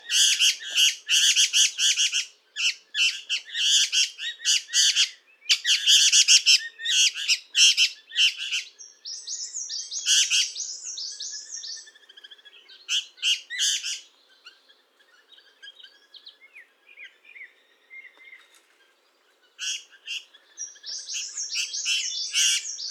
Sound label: Babax lanceolatus; پرنده باباکس چینی